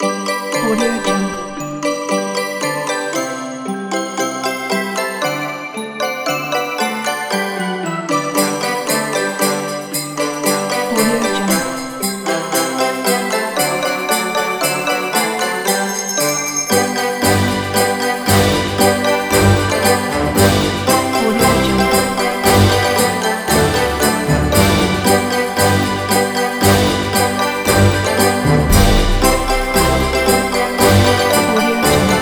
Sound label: christmas music